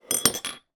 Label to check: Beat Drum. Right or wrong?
Wrong